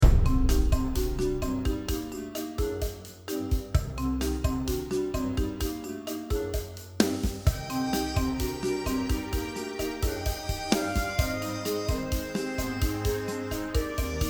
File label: Mallet and Brass; Fun Mystery